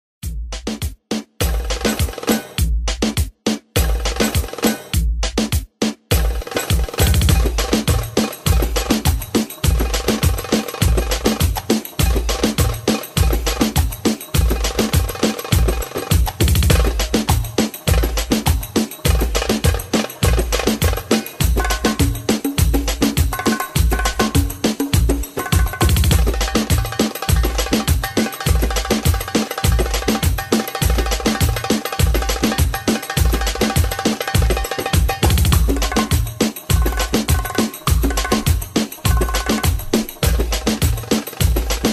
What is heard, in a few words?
MUSIC SOLO ORIENTAL